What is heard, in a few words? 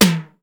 SDS7 KIT, Elec. Percussion; E-MU Producer Series Vol. 2; More Studio Essentials; Composer